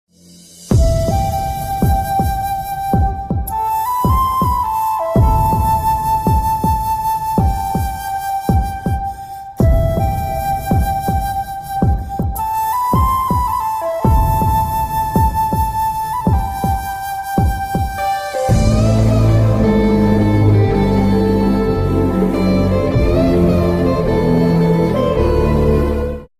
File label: flute instrumental bgm